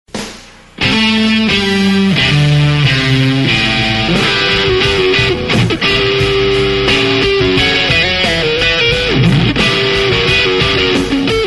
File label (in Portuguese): Blues Rasgado Improviso